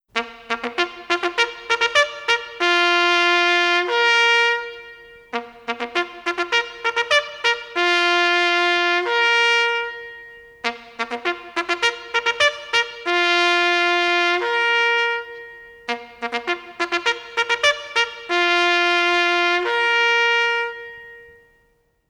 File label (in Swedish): Generalsappell